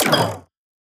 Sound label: Impact